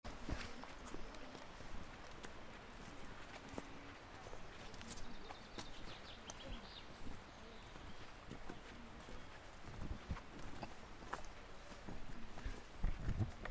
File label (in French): ambiance marche sentier voix